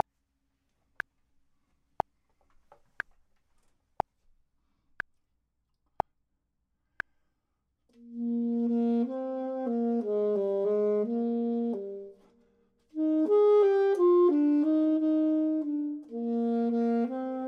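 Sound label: bottom, Saxophone and Clarinet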